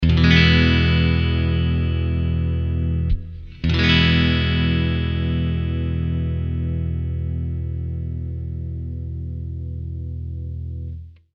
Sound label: vintage clean bridge